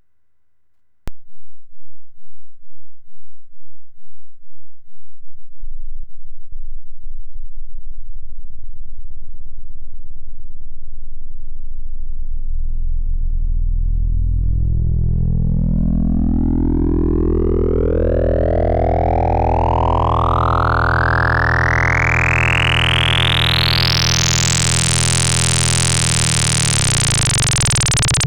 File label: DVCO Sine X-Mod; macbeth; xseries